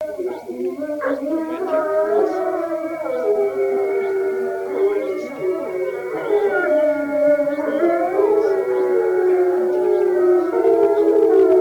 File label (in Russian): Волчья стая Branco di lupi; plurifon